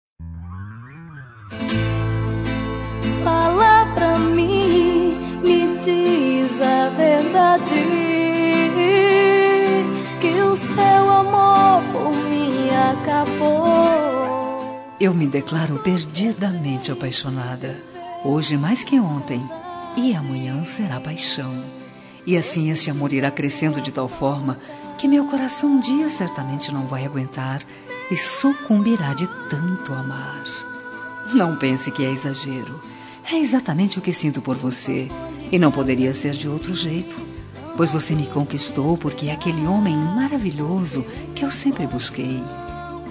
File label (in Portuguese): ROMANTICO F fala pra mim; Romantica; Fem